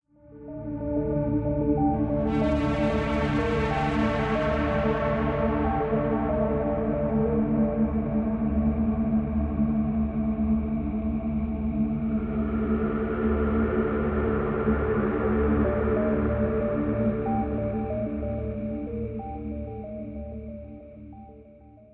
Long Spooky; Fortnite Save the World

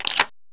reload shotgun Shell; SAS: Zombie Assault 4

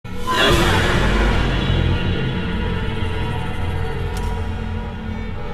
SHOCK; F.E.A.R. Perseus Mandate

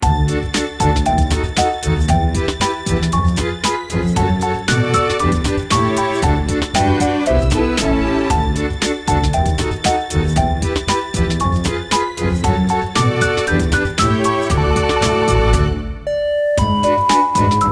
BGM Menu; koisuru purin koi wa daibouken dr. kanmi no yabou; nds

